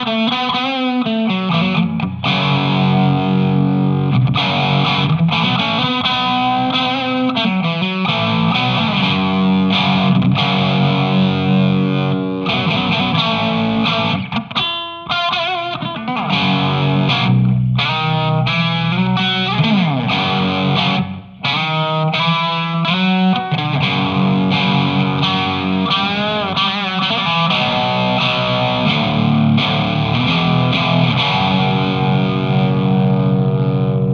Single Coil Mode; Walrus Audio Ages

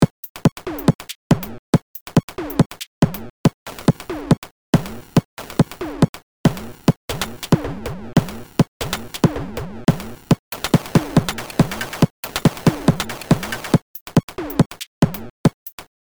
TR 707; Roland Cloud

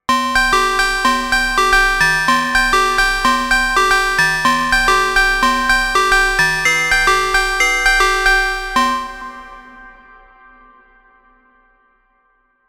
Synth Chimes; Cherry Audio Mercury-4

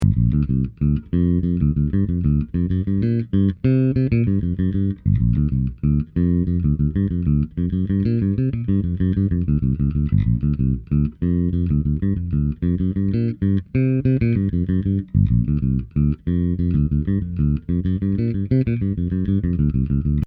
svt style

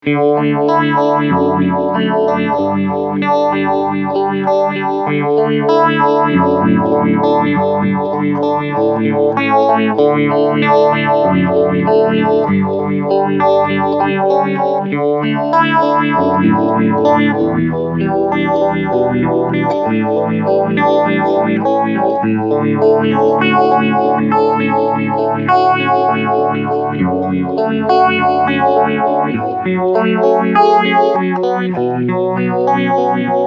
Fast Rate

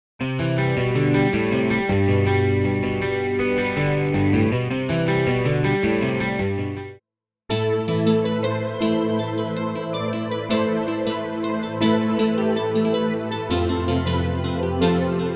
Guitars

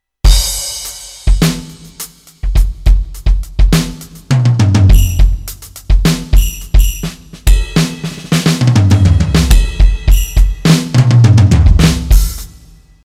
Roland TD-4KP

